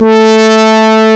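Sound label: brass synth, ANALOG; CMI Sound Library Rev 1.3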